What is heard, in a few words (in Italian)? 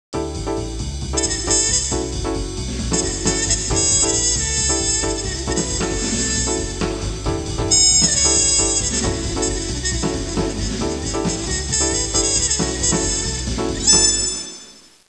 suonerie musica jazz